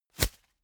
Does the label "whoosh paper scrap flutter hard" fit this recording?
Yes